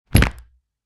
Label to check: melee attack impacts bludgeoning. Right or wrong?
Right